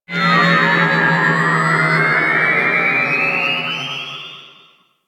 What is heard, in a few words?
Corpus Ship Exterior Space Drones, Ambience; Warframe; Corpus Ship Remaster